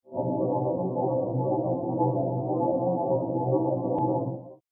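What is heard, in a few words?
illusion ready loop, magic; skyrim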